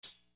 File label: Tambourine; Sonic Foundry (Sony Creative Software); Infinite Soul VOL-1